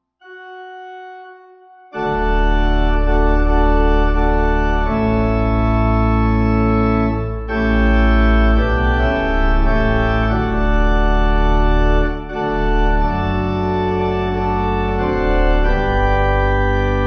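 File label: Pipe organ, church music backing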